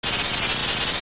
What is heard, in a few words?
Shock; Biri; zelda; OOT